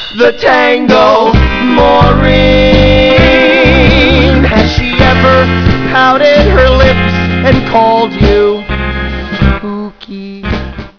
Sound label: tango